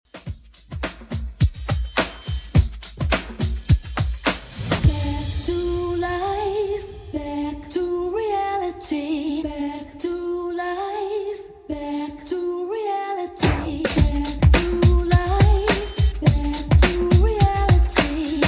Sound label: 80s Redrum 105bpm